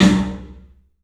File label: PA DEEP L, CLUB SN VRB; 3D Sound; 3D Drums